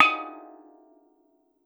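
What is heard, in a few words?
STEEL DR, Steel Drum Lead Pan, Pitched Percussion; E-MU Producer Series Vol. 7 – Old World Instruments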